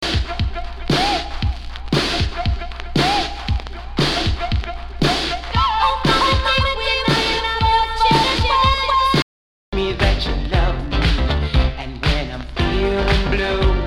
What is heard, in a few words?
break dance; lp vinil